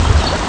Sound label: wefl or song3; Passeriformes; Tyrannidae